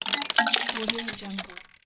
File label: icicle tinkle; electronplanet